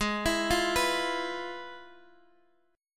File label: F Minor Major 7th Flat 5th, Ab